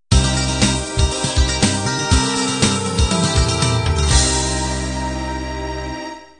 6 string beat end

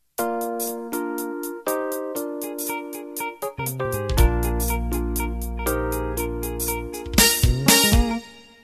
Smooth Jazz Intro

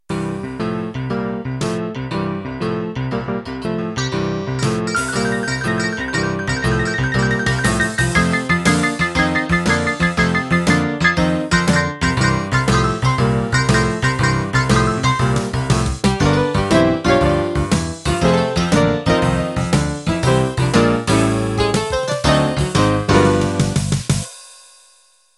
Rockabilly Intro

